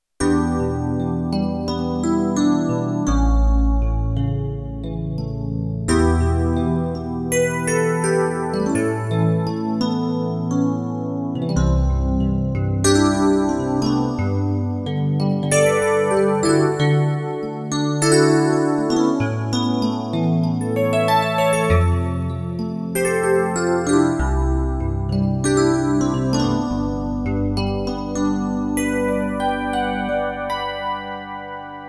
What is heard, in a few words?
Crystal Rhodes, Roland JD-800; Factory Patches